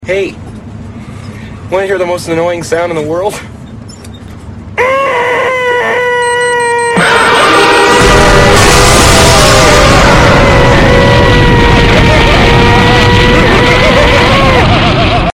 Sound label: Noise